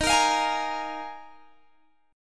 D sharp Suspended 2nd Suspended 4th D sharp Guitar Open G